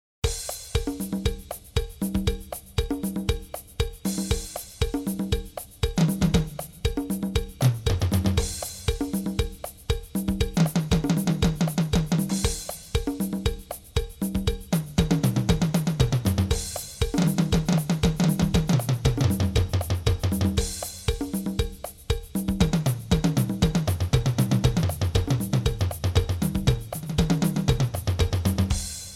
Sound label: drum solo mono